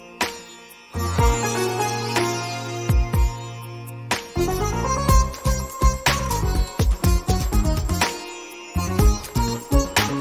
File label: Emotional Music